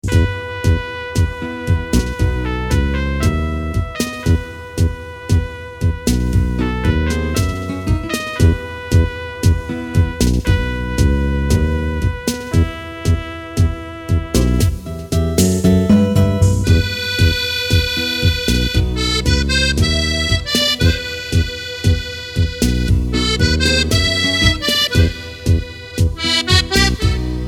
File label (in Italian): tango